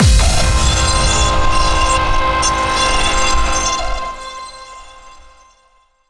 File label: Action Boss; PunkGirl